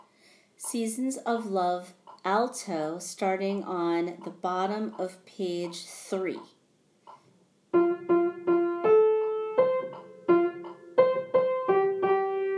Alto; Broadway Shabbat

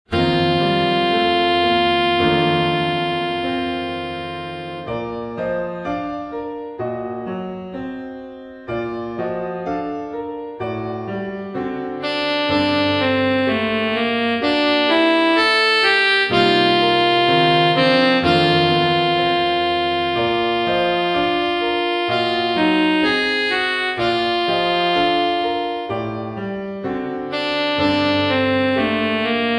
Romance w Sax; Part 3 Reduced

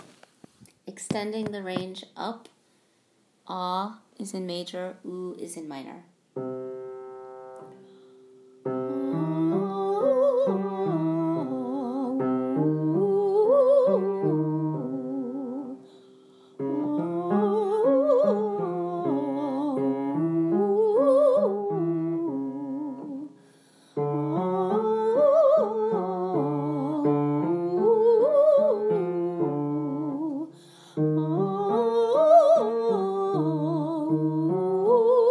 Warm Ups; Broadway Shabbat

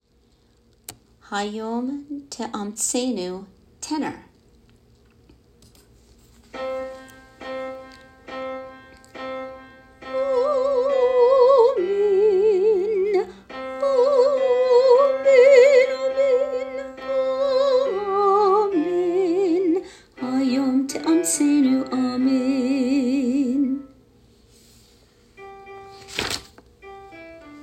Hayom Tenor

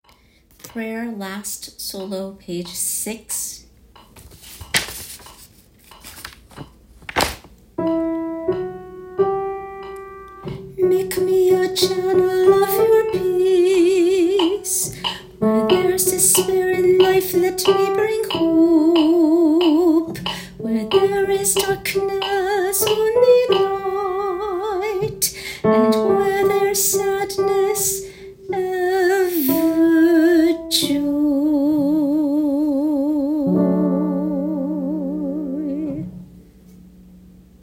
Prayer last solo; Broadway Shabbat

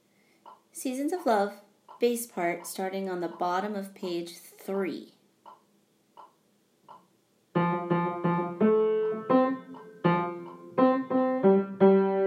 Bass; Broadway Shabbat

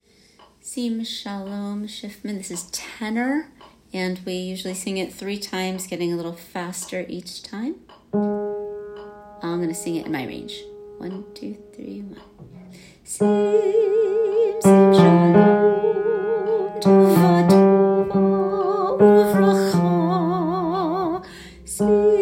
Sim Shalom Tenor; Sim Shalom - Shifman

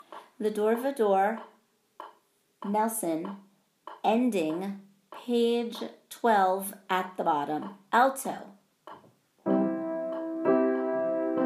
Ending Alto

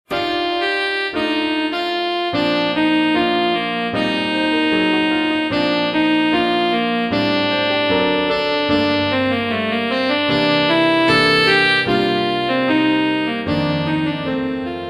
Romance w Sax; Part 2 Full

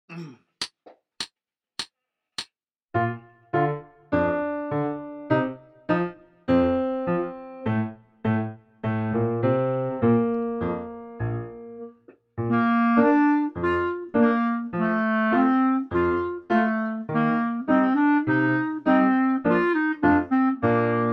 Dance Song Clarinet; 10pct Slower

